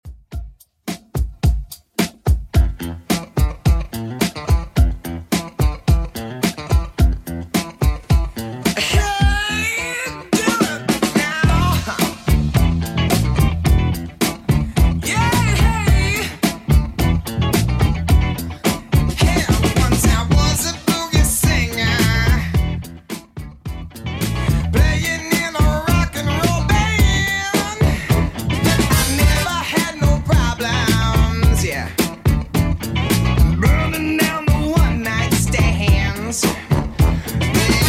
70s Redrum 108bpm

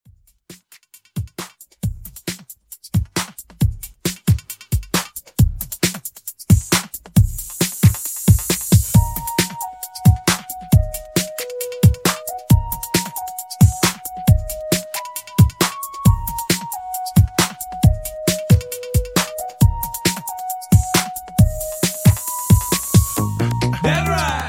90s Hip Hop Redrum; Remixfreakz

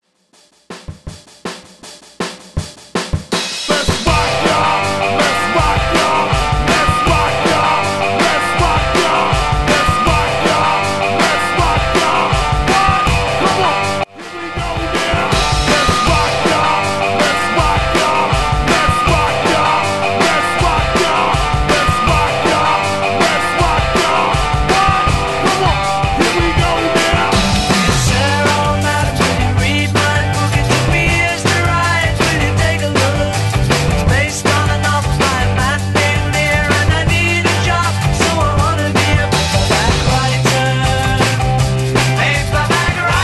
Hyped Intro Outro 60s Redrum 80 bpm

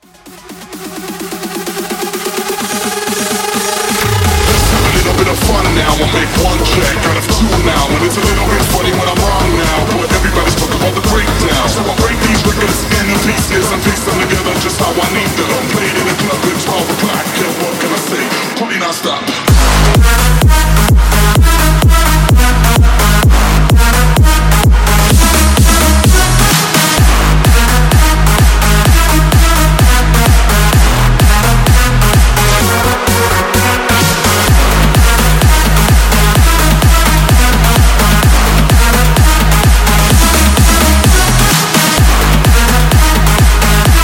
Samba Big Room

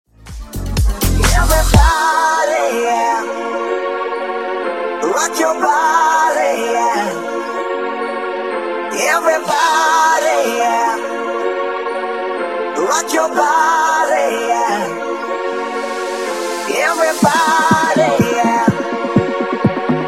Funk 124Bpm

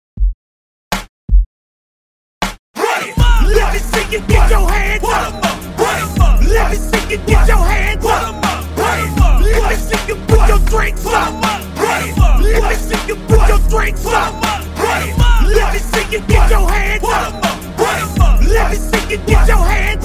80 105 Transition

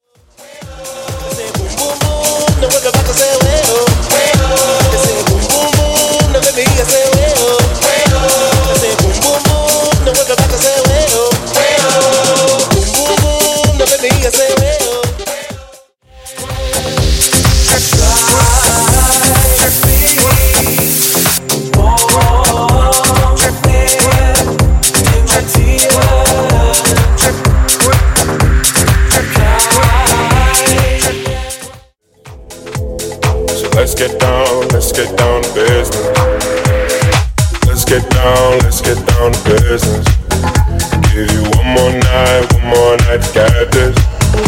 House Mixes 129 122 Bpm

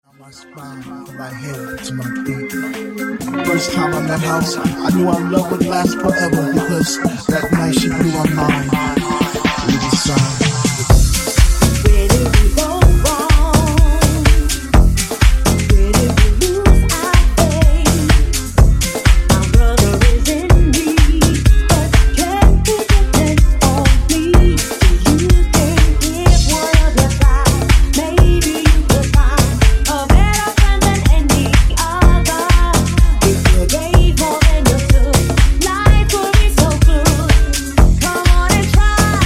Funk Remix 125Bpm

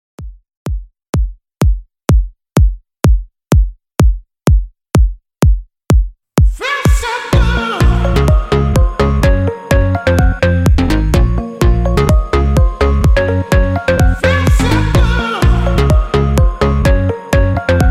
Mashup 126Bpm

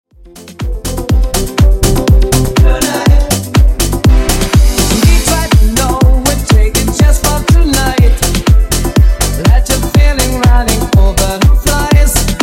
Funk 122Bpm

